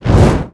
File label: torch; pirate room sfx